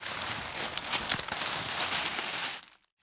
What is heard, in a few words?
rustling; jungle-journey